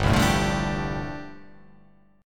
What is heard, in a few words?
G 9th Suspended 4th